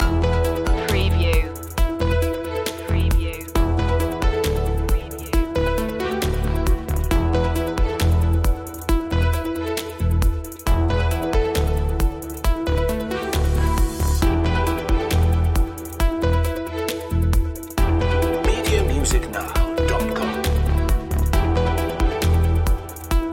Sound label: digital environment loop